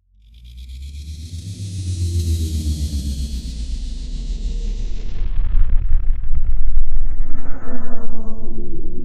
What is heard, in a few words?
very quickly rotating obj